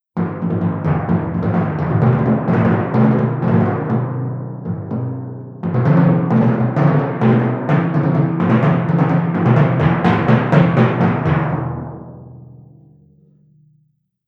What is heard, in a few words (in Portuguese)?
timpanos; Conhecendo Instrumentos Musicais